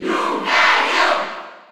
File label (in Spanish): Cheer Spanish PAL; Lucario; SSB4